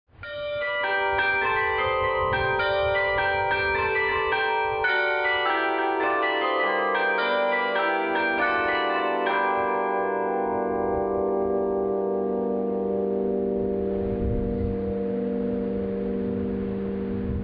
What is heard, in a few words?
Carillon